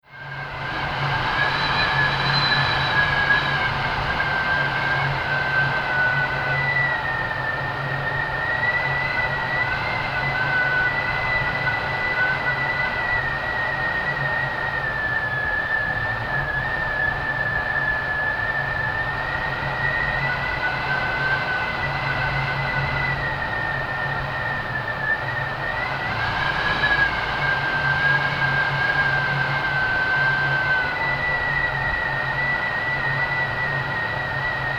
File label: Contact Mic Wind